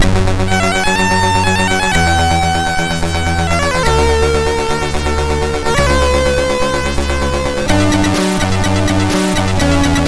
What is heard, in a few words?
sid MOS6581R4; Radax